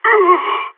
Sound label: death; Counter Strike; CS:GO; phoenix